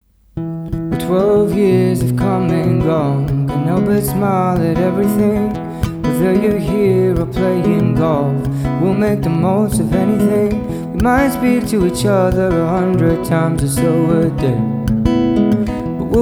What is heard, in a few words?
Keep Lauging With You